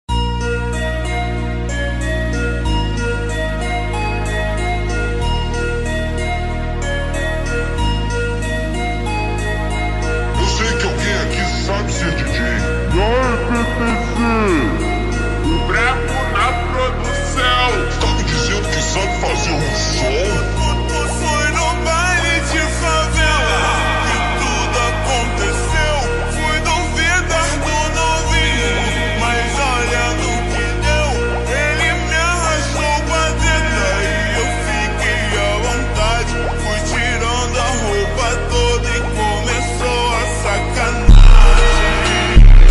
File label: slowed reverb Brazilian phonk